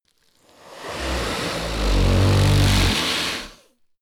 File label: monster snore breath low; comedy-cartoon; soundpool